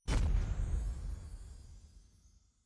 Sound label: mines explosion